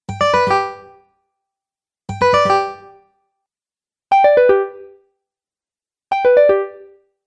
Game Bonus Descending Strum Collection, Video Game Sound Effects